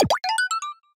Propeller Block Appear; SM3DL

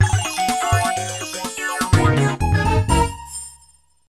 Stage Beaten; Hotel 3; Hotel Mario

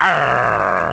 Growl; Wario; Mario Kart Double Dash

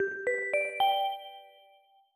Lobby Bell

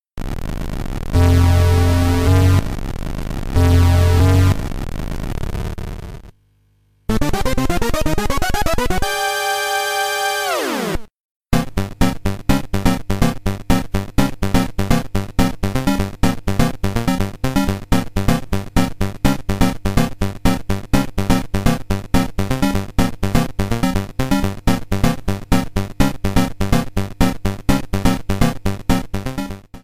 Music Boss

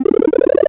Vine; SMW

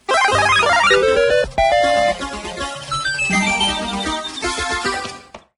World Clear Arrangement; SMBSS

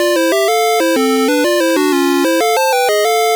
Jingle; Stage 4

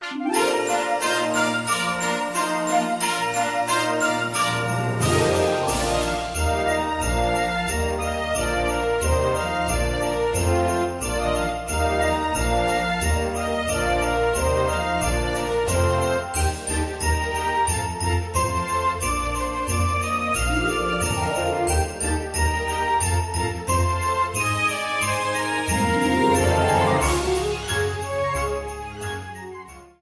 A Little Light Snowfall

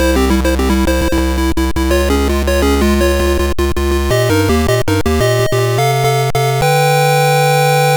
World Clear; SMBS; Sharp X1; Super Mario Bros. Special

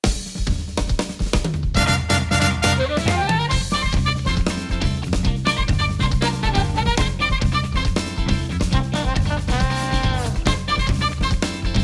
Choco Island Samba Arrangement

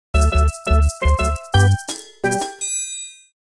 Dancing Sticker Sound; Toad and Boo

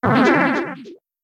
Item Shippo Appear; MK7

